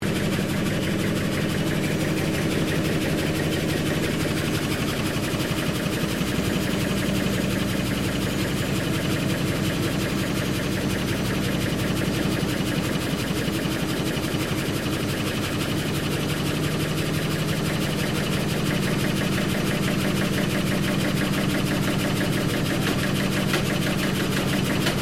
PUMP THOMSONS CIRCULATING OPERATING LOOP, Sounds onboard HMAS Castlemaine; Boats